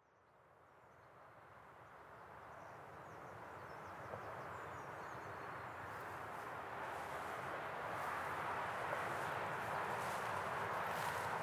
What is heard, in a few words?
Marsh Gates Soundscape